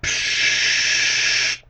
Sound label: Pshhhh